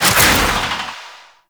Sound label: Ability Charged Throw; Warframe; Trapper